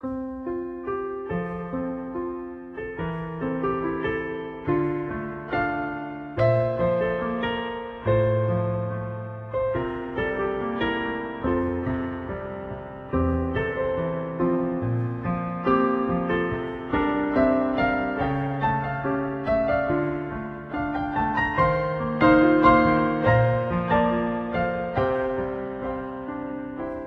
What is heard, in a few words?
Short Happy Piano Song; gamerip; menherafflesia flowering abyss; android ios mobile switch windows